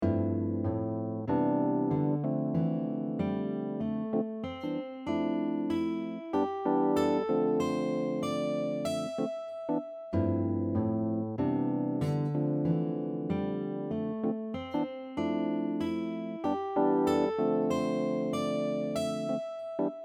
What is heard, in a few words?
Pentatonic example, guitar